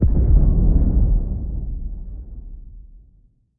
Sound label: ambient; World of Tanks